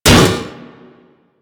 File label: Drone Fall Impact B; Warframe; Grineer Drone